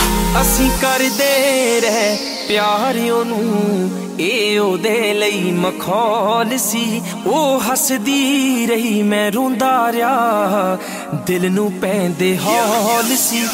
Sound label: Punjabi Sad Song Ringtone